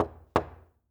door knock glass; anemoi

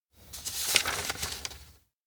peek in; anemoi

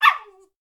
dog voice; anemoi

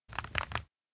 map tear drop; anemoi パッケージ版

